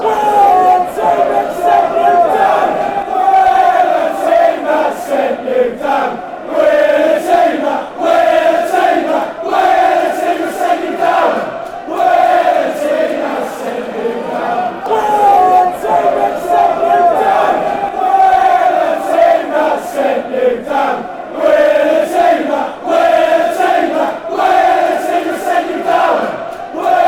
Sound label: were team sent you down, fan chants; Forever and ever, sung regularly, another Ipswich football song